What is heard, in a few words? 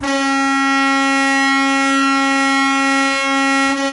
Cruiser Horn; lethal company